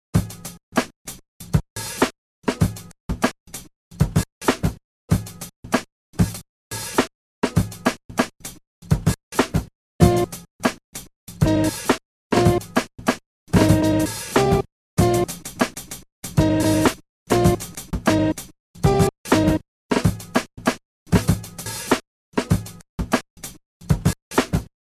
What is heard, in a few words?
60's Phaser; by Travis Phaser